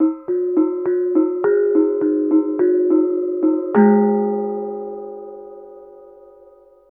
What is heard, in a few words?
KENONG; Spectrasonics; Heart of Asia; KENONG PH